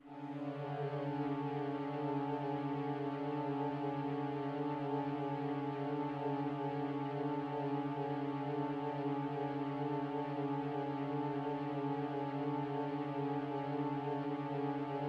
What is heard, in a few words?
Tragic Note; Why Pizza; PS4; Xbox Series X|S